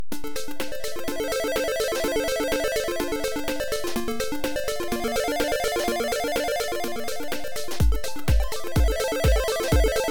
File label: Jungle Bubble PSG Digidrums 50Hz 125bpm; Kong Country Returns